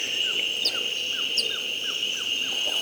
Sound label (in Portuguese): canto do topetinho do brasil central